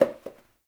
Janitor Paper Towel Drop; Warframe; Ambience; NineteenNinetyNine

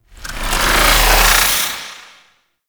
Mech Shield Bash Telegraph; Warframe; Nechro Mech; Abilities

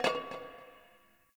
Garbage Metal Can

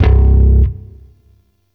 Shawzin Oct One C; Warframe; Sentient Shawzin

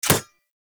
Rising Blades Sword Impact; Warframe; Pax Duviricus

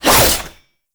Fist Claw Alt Whoosh; Incarnon

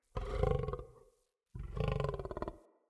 Wolf Idle Grunt; Animals; Vocals

